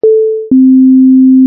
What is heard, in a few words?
tuning fork